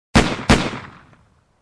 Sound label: Gun Shots Twice outside